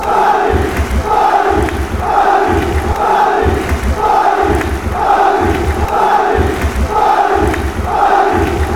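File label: bari, football songs and soccer chants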